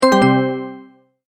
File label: multimedia tone, Goodbye